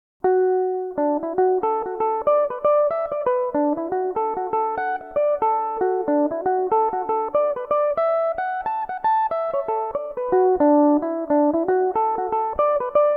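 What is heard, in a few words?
D major, mandolin; Old Lurgan Town; Belmuse EM-190 electric mandolin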